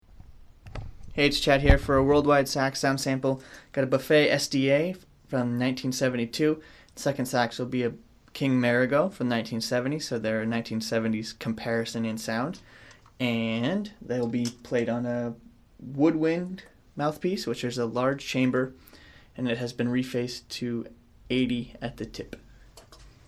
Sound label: alto sax; SDA; KingSML